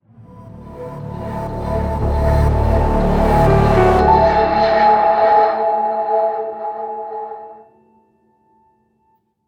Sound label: music hype stinger single-note